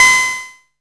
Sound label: OC-NZ ATC C5, SYNTHESIZER